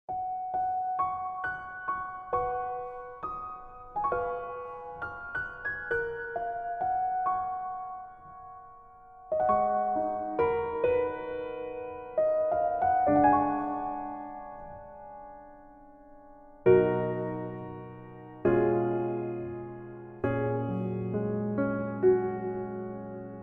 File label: Piano ver